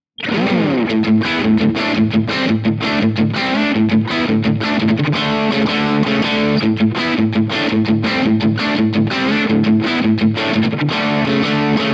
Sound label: characteristic sound; jensentone